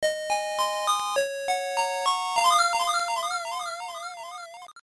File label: game over; sonic 8bit; sonicchaos